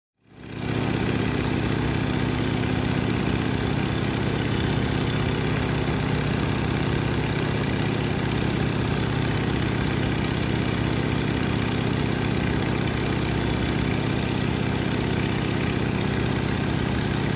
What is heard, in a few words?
Aircraft Propeller Noise Near